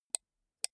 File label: computer mouse right click slow soft